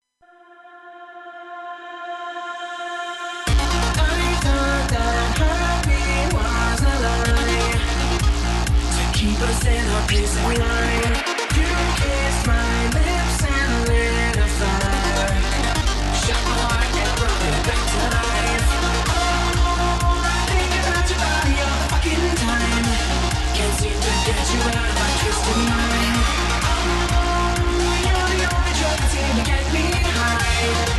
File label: noise; Virtual Hard Mondays Session 17